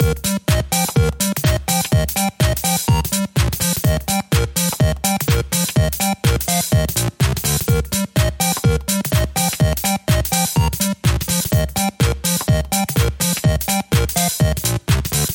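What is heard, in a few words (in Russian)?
zvuki dlia uvedomlenii na telefon v stile 8-bit, 8-bit style phone notification sounds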